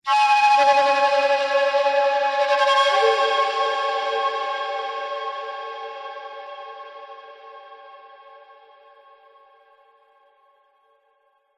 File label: musical flute recorder big reverb blow mysterious tribal